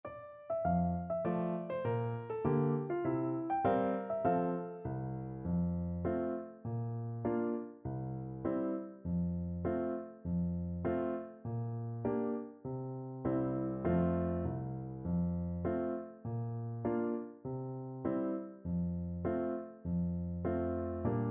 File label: Country Gardens, Clarinet